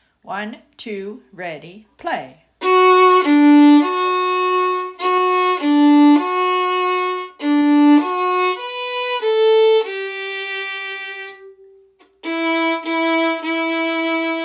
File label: Chanukah Harmony